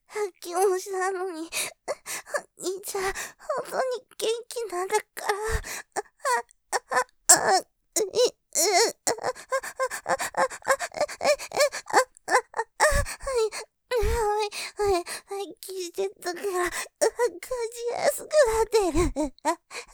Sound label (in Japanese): 喘ぎ; さっきもしたのに、お兄ちゃん元気なんだから；；; ループで楽しむ！シェフの気まぐれ抜き抜きコース; モノラル